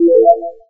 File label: Load Sound